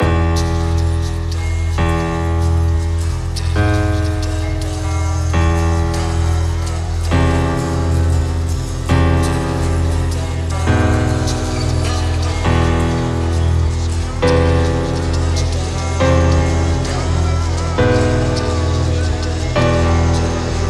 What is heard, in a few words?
pianola